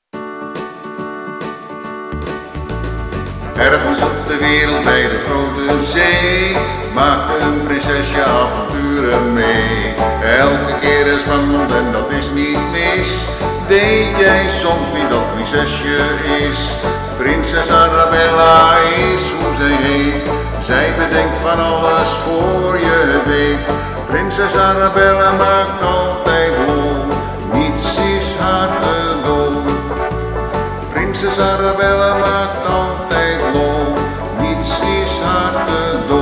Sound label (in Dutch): Prinses Arabella muziek en zang; Bieb Almere